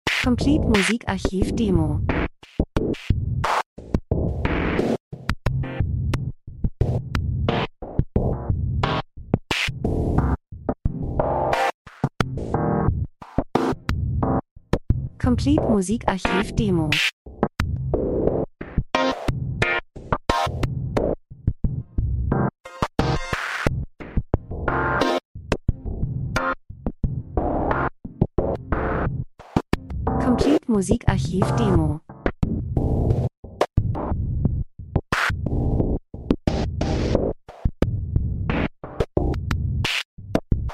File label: drums; Elektro Rhythmus Experimentell Wissenschaft Moleküle Pulsschlag Produktion Ablauf Verfahrenstechnik Maschine Industrie